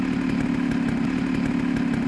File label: eng loop, moto